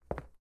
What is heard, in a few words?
Walk; portal quest android; Mother Nature